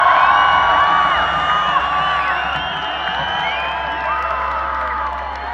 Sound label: Bass Solo